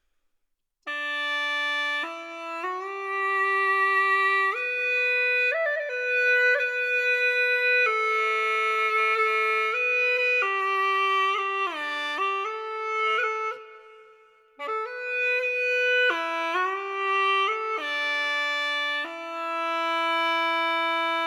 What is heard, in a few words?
KHULUSI, wind hulusi; wind hulusi pro